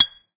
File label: Tiles Sfx